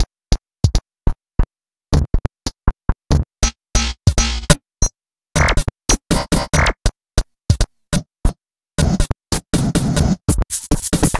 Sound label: Broken snares